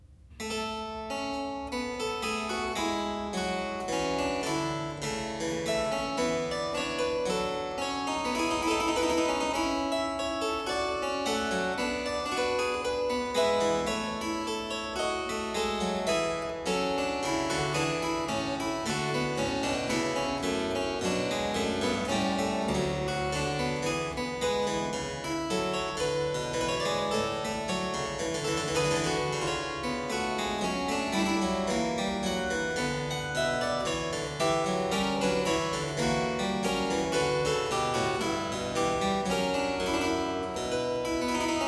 Dreistimmige Inventionen in B flat major, Keyboard Works; J.S. Bach; BWV 800; Johann Sebastian Bach; Inventionen & Sinfonias